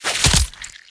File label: Deepcoiler Attack